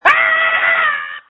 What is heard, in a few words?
screams